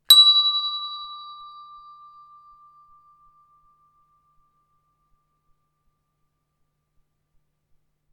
Hand Bells D Single

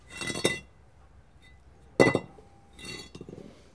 Brick Hits

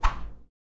Whip

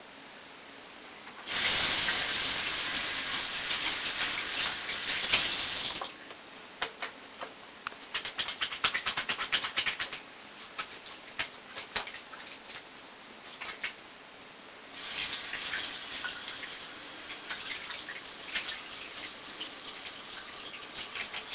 Toilet

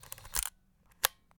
Vintage Camera Advance Sound

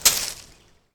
Leaves

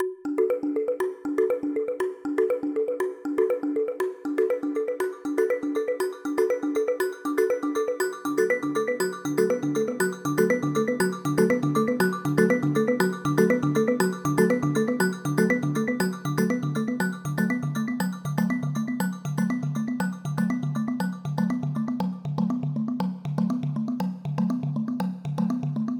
Safari Loop

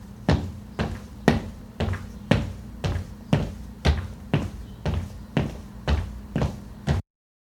Walking

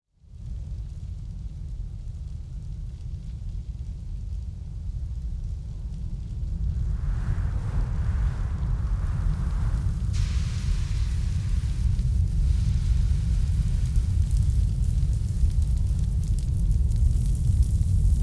Fire burn Big Fire In The Forest Artificial

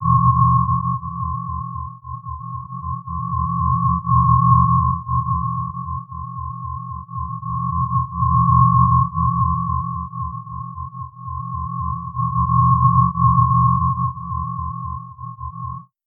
Pulsating

